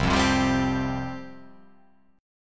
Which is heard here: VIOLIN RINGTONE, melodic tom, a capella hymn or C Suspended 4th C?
C Suspended 4th C